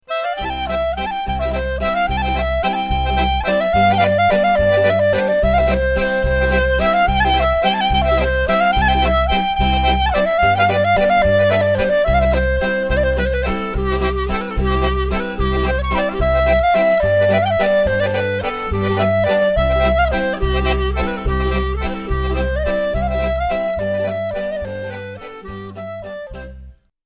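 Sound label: bourree tournante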